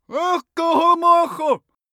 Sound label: male shout arabian